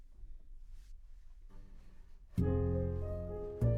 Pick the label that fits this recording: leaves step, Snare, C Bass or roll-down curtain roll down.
C Bass